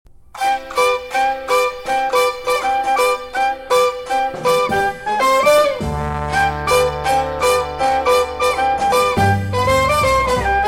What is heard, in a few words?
Orchestra